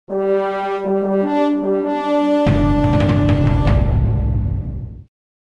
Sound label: Bugle Sms